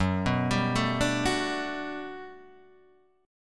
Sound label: G Major 9th, F sharp